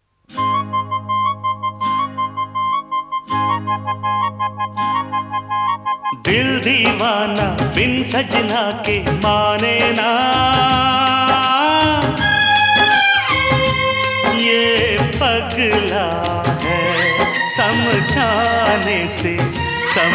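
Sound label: Duet, Hindi song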